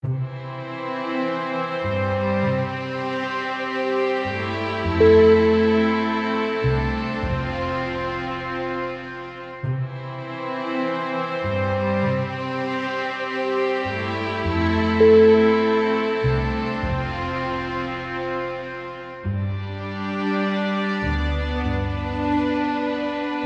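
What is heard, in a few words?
brazos rising orchestral, music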